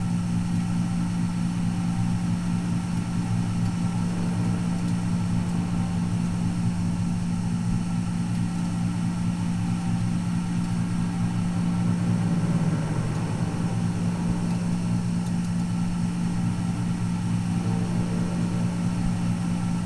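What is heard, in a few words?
ambience boilers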